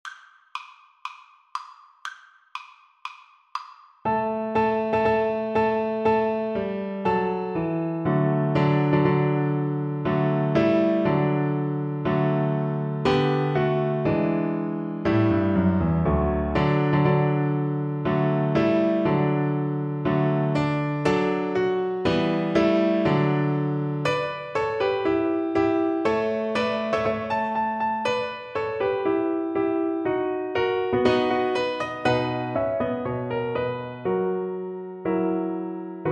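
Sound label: viola karaoke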